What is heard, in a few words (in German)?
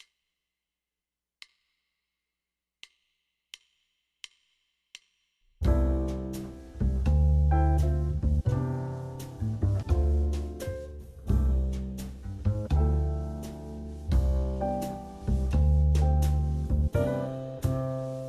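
C-Dur klingend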